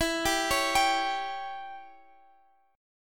Db Diminished E, chord

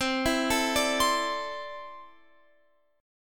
C 6th Add 9th C down Guitar Open F; C6add9 arpeggiated

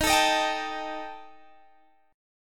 Eb Major Flat 5th, down, Guitar, Open G

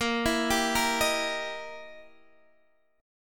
E Minor Major 7th Flat 5th, A sharp

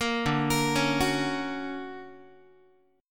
D Augmented Major 7th down Guitar; D+M7 arpeggiated; JGuitar

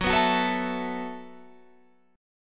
E Suspended 2nd Suspended 4th F sharp

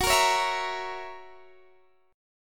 Gb 7th Gb down Guitar Open D, chord

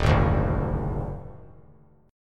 Eb Minor Major 7th Double Flat 5th; JGuitar

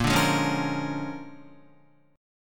Bb 7th Sharp 9th Bb Guitar, chord; 4E,4A,5D,5G,5C,6F; Bb7#9 strummed; 6,5,6,6,5,5; JGuitar

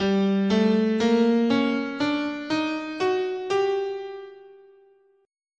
G Hungarian Gypsy, scale

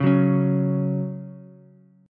Gb 5th Gb down Ukulele; Gb5 strummed; JGuitar

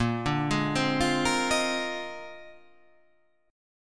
A sharp 11th

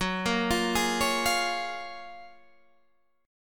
Gb Major 9th, down, Guitar, Half Step Down

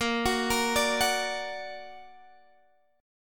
Bb Augmented Bb Guitar Open G; x,15,16,15,15,16

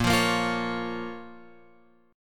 A sharp Minor, down, Guitar, Open F; strummed; JGuitar

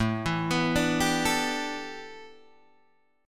A 9th Suspended 4th, Guitar Open G; chord

